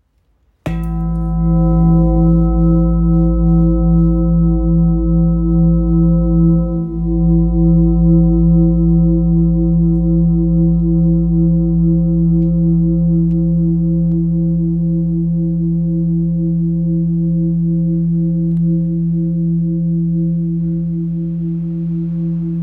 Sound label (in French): Bol gros volume couple tantrique 8.03kg mi